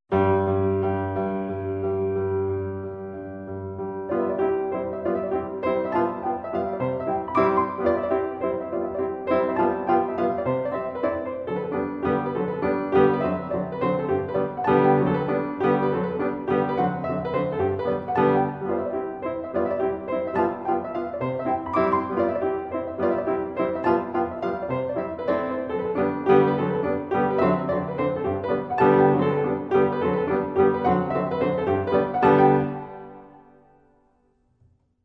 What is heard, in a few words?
Mazurka in C major; Op 7 No 5